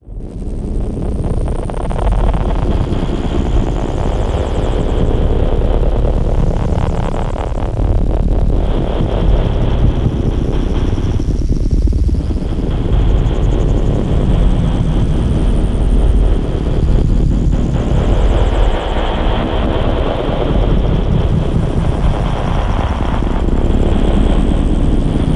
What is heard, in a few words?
cinematic trailer ambience; bluezone; thorium; sonniss gdc